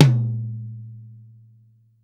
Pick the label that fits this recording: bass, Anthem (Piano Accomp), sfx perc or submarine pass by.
sfx perc